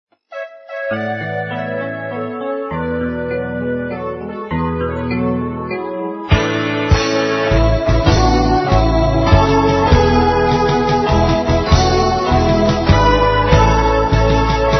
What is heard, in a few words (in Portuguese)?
Parabéns a Você instrumental